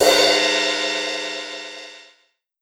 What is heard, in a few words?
Crash CYM; SN-R8-11 Metallic Percussion